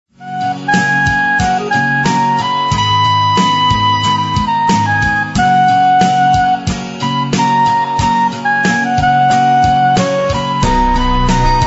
royalty free music

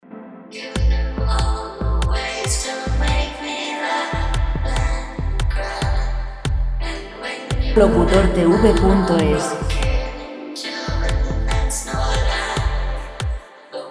72 vocal

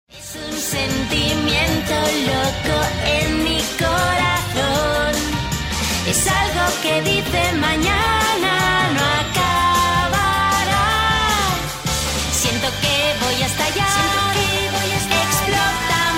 cantante singer spanish

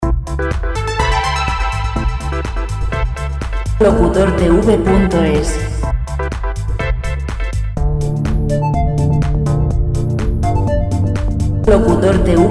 124 dance

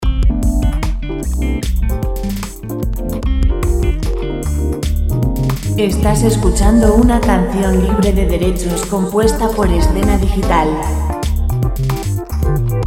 korea 75 lent; relajante musica libre

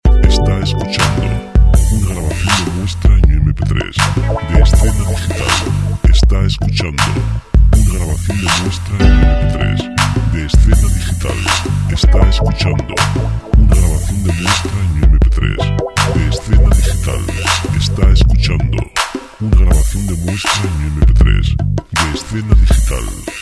amores 80 lent

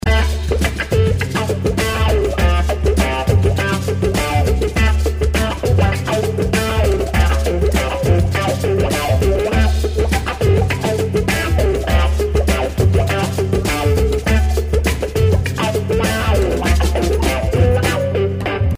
101 rock

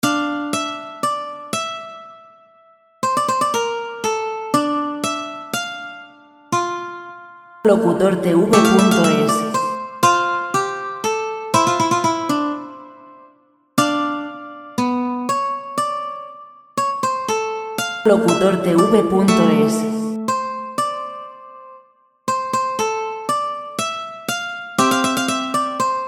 guitar classic

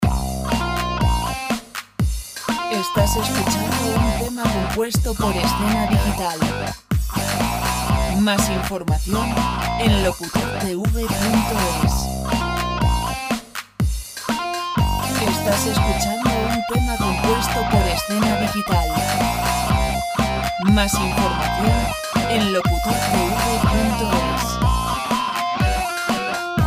122 C rock; Música de rock sin copyright

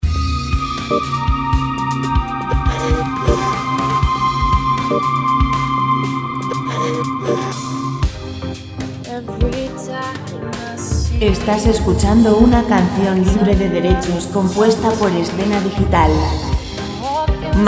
120 lent vocal; vocal background music

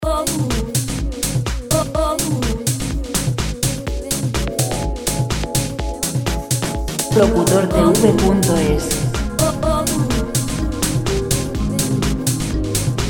125 vocal; Música sin derechos de autor para fondos de vídeos. Música vocal

